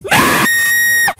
flamingo screaming; Flamingo how

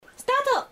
start

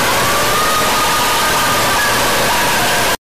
ale ale ale ale ale

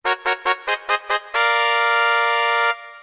level up

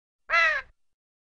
crow sound effect

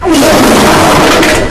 fart

